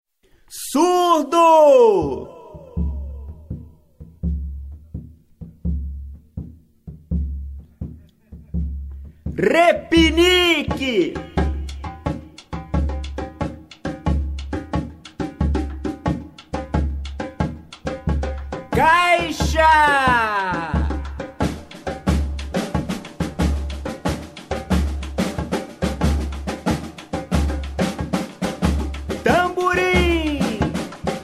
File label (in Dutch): Alle samba instrumenten